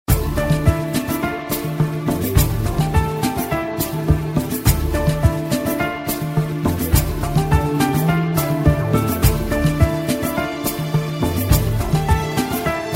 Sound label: piano orchestra ringtone